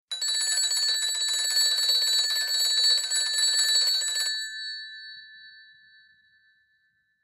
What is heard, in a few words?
Old Brit Desk Tel Hand, pulse rotary rings